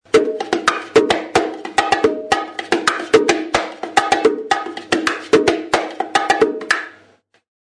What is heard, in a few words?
SLAP, bongo